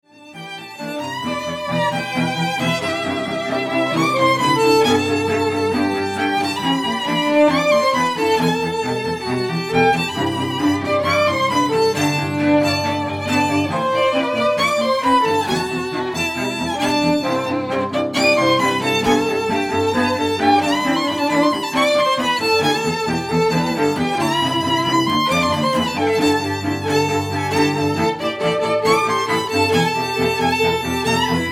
- from mid, String Trio

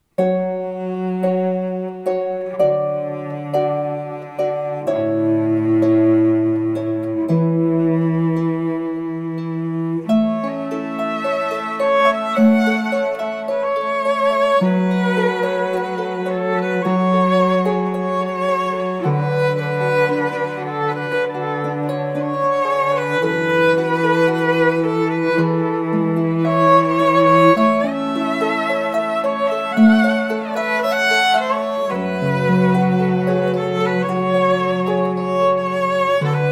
harp trio

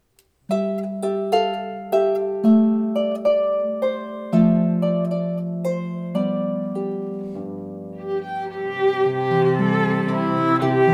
harp trio

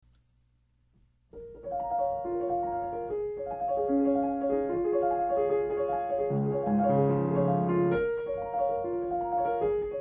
piano

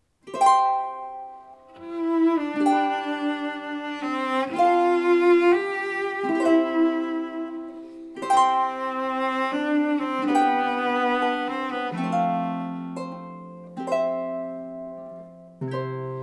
with harp

